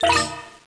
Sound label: Grab Handle